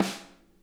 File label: SNARE, Interactive Drum Kits; Sonic Reality